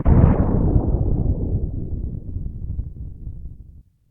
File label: ship secondary blastwave; starruler